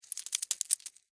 Spider Run Stop, Spider, Run, Stop, Scurry, Movement, Arachnid, Insect, Bug; Animals:Insects; Spiders; Blastwave